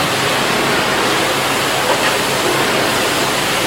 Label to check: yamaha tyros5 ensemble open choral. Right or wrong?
Wrong